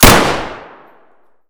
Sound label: sv98 fire indoor close; Escape from Tarkov